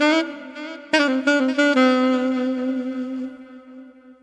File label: sax; Phonky